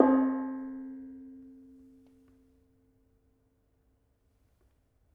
Bonang Barung Sl 1, gamelan; gravityharps; timbrebox

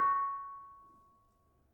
Panerus 6 C sharp, gravity harps tuned

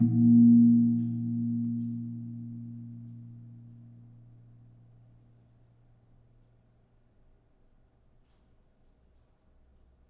Kempul Pl 6, gamelan; gravityharps; timbrebox

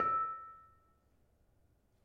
Bonang Panerus, gamelan

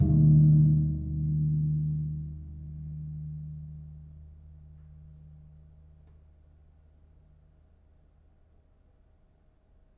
Gong Suwukan, gamelan

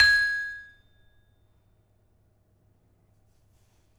Peking hard, gamelan

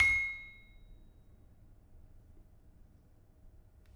Peking soft, gamelan

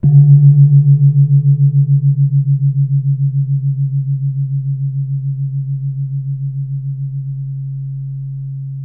jegogan, gamelan; gravityharps

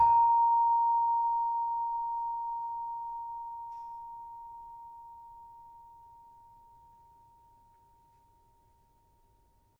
Saron 5 A Sharp, gamelan tuned

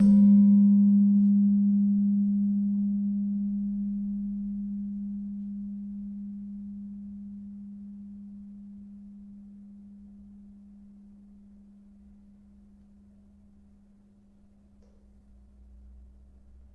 Slentem 3G Sharp, gamelan tuned; gravityharps

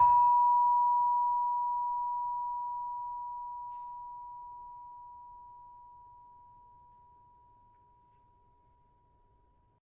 Saron 5 B, gravity harps tuned

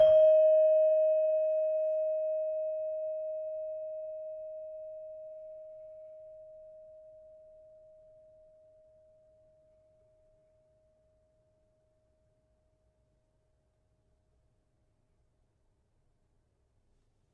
Saron 5 D Sharp, gamelan tuned

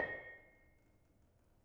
Bonang Panerus soft, gamelan; gravityharps; timbrebox

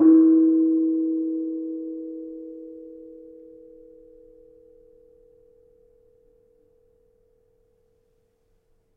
Kenong, gamelan

